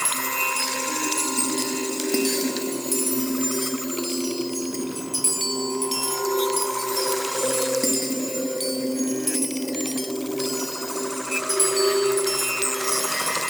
Patrol Loop; Warframe; The New War; Deacons

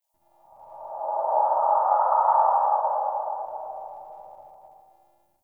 Ext Eerie Howl Cry; Ambience; Misc Outdoor; Warframe; Infested Eris

